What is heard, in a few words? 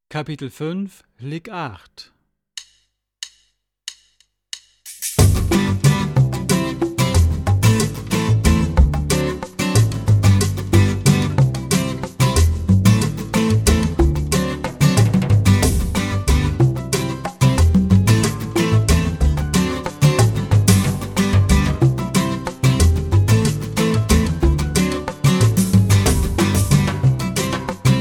Latin Style Guitar